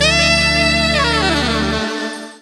Horizontal Trumpet; M8

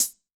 Close, HiHat; Yamaha DTXpress-II

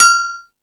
Percussion

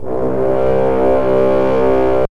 FRENCH HORNS; OMI - Universe of Sounds

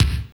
kick dirt, dance kicks; Roland L-CD701; Dance K1

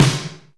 Reverb Kick Snare; PS-37 Dance Synths; Percussion

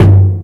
Drum, Roland JX-305

